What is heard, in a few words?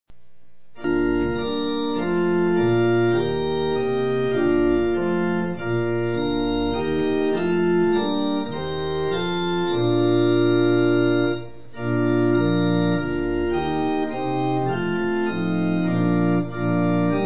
ride on ride hymn, organ; lutheran